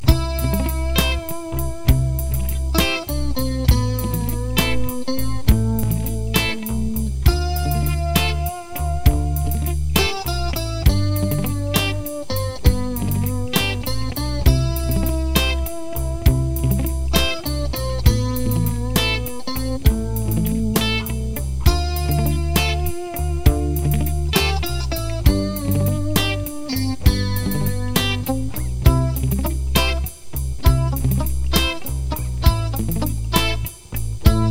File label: C Am Dm G